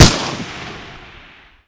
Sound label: pkm fire; firearms